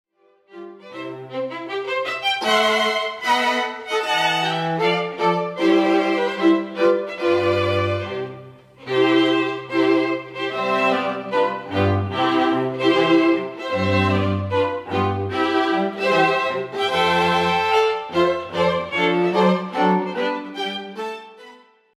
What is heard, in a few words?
Mendelssohn March